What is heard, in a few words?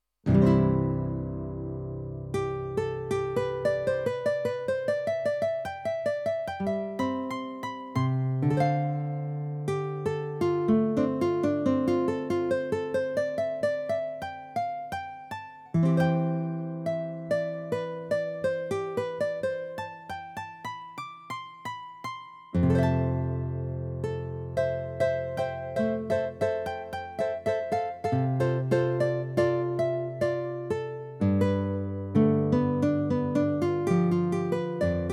Guitar